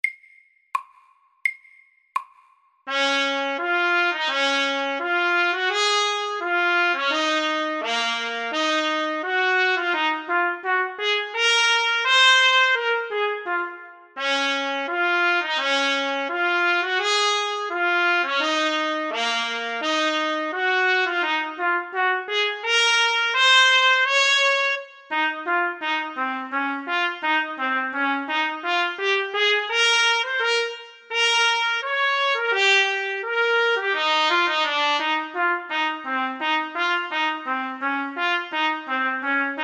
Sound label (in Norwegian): Etyde i Ess dur SAKTE; Clarke